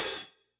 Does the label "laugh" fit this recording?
No